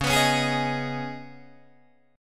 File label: Db 7th Suspended 2nd, Guitar, Open D